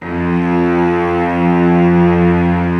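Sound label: Cello F1; Digital Sound Factory Virtuoso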